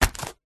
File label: foley footstep single barefoot walking cardboard